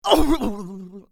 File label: Pig hit; bad piggies; android; mobile; windows